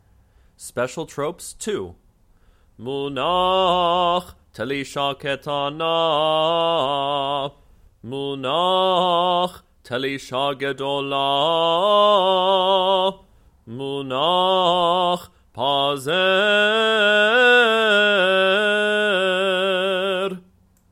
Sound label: Haftarah Trope Special Tropes